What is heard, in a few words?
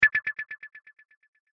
Alien Ufo Radar Bleep Echo; Alien Sound Effects; science fiction sound effect; star wars / trek style games